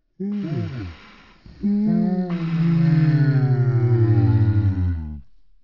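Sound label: ambience China 3D ambience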